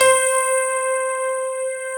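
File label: grand piano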